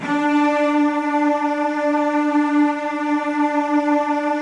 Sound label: Virtuoso Strings, D3; ILIO; Kirk Hunter Virtuoso Strings VOL-2; 90 s Sample CDs